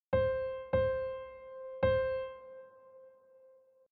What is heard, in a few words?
sop note